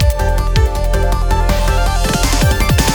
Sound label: Snow Transition; sky rogue